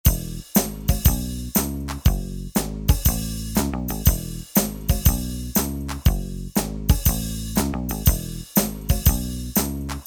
Shuffle

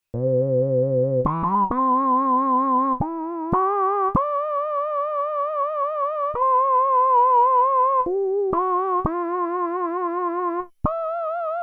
MOUTH WAH, Korg DW-8000; Factory Patches

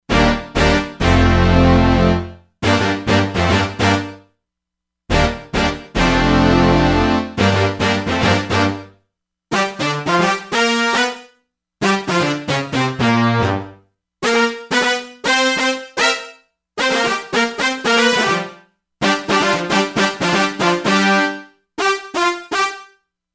Stac Brass, Korg MSC-07S Synth

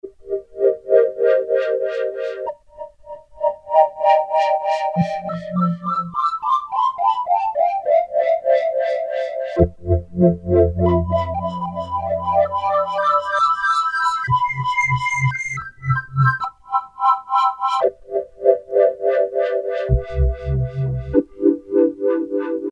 Steemechoes, Roland W-30; Factory Performance Patches; Factory B

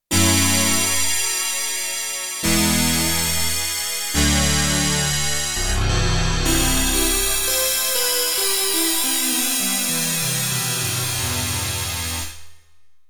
DLM Keys

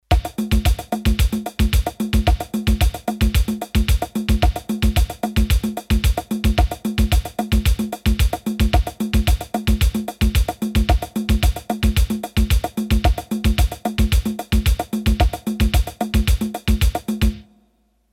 Samba, Roland D-10; Rhythm Demos

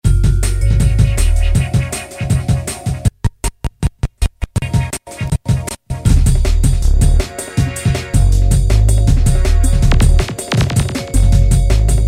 Drum 'n' Bass, Yamaha DJX-IIB

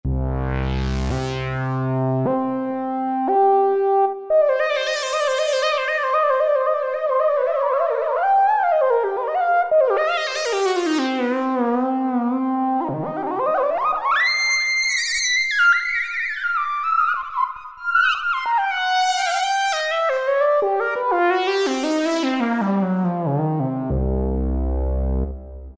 Lead Earth Lead

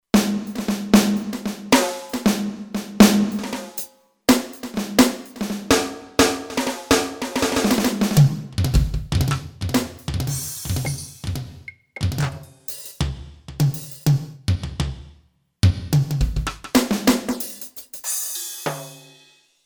2 Live Kits; Factory Programs

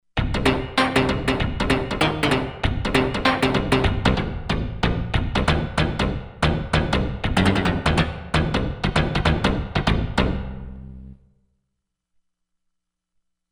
EnvBass; Environment

